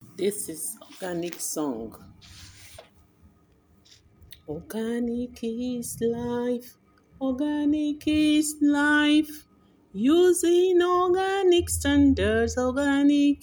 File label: Organic Song; Nigeria